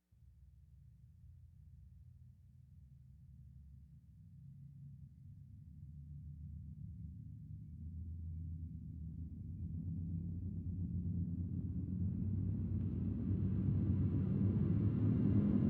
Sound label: Rise; Ninja Tracks